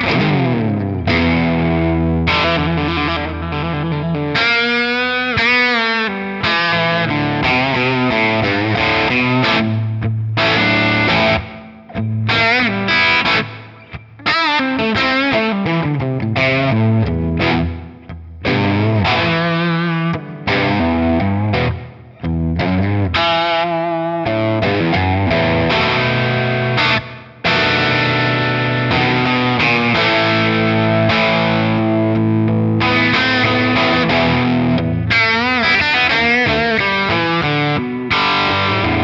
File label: Valeton GP 200LT Twiggy Blues